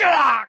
spirit death; FlyForInsanity

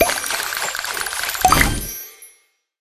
fish swim; Candy Crush Friends

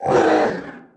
death; FlyForInsanity

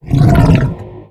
Grrr Dmg; FlyForInsanity

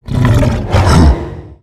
Grrr Atk; FlyForInsanity

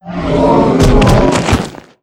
Crow Die; FlyForInsanity

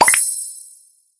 purchase; Candy Crush Friends

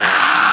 Pukepuke Dmg; FlyForInsanity

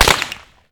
chain blocker hit; Candy Crush Friends

